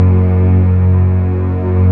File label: STR Orchestral Strings